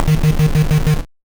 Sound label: Noise A, 8-bit, Bitty Noises